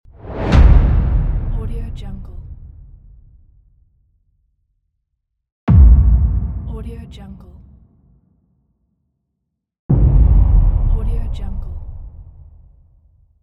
boom

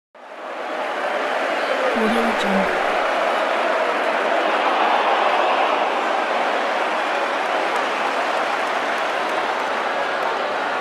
football match ambience

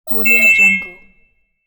electronic computer signal

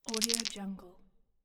shaking playing dice

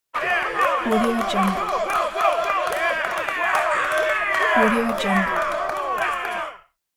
male sport fans

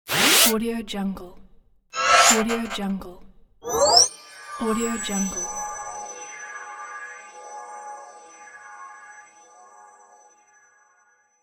loading; magicsoundflower

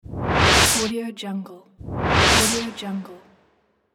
swoosh

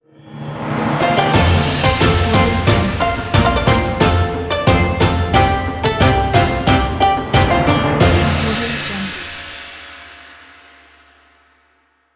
chinese new year opener

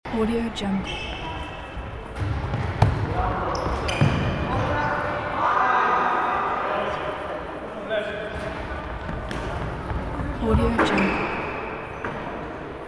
hand ball match in gym hall ambience

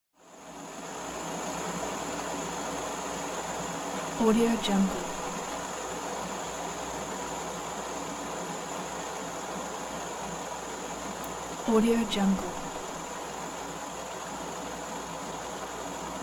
teapot whistle; eternal-future-productions

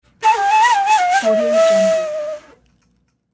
western flute; mike-88

